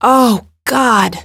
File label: girls, pain